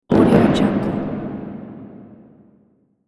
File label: atmospheric impact; film and game